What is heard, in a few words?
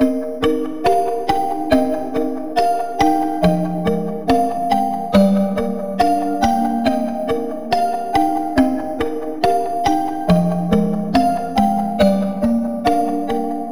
Nostalgic Melody Loop - 140 BPM C Min; Cymatics; Oracle Sample Pack